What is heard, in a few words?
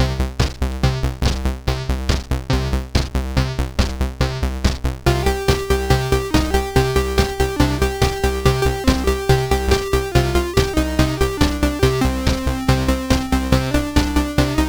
Fire Breath, CSG8580R5